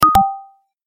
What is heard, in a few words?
new message